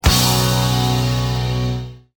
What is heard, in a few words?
door